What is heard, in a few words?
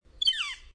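Northern Flicker peah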